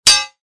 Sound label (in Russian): udar; Звук мечей; Звуки сражений на мечах, звук ударов меча